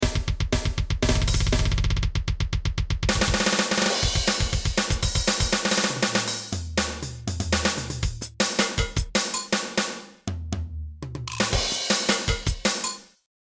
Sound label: Weird Drum Solo